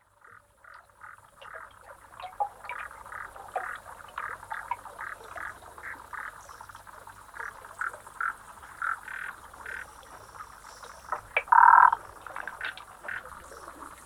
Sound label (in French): gourami